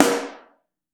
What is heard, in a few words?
SN Mono, Snare; Ableton Foundation FreePacks; Snare Designer